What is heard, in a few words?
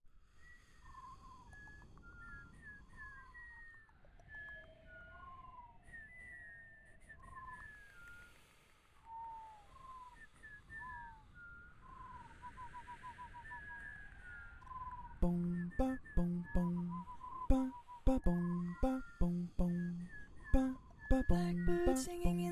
bass